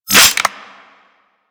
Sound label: Energy Rifle Reload; Warframe; Weapons